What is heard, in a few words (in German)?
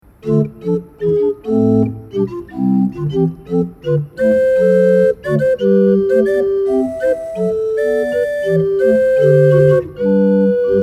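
Kliemt 7 II P, pfeifenorgeln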